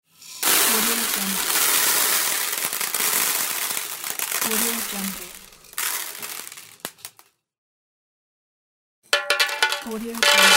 pour cereal with package noises and foley sounds pack